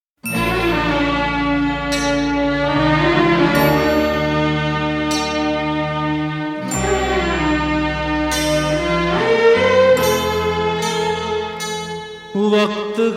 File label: Male Version; Awaaz